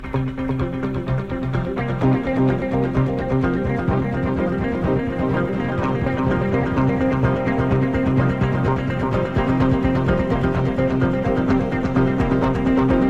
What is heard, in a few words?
Echo Waves, Electric Guitar; 1974